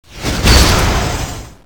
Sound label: skill start; deimos; cso